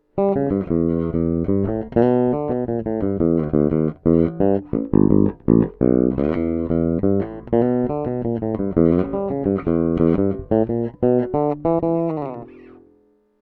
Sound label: PJ Bridge Thump midboost DI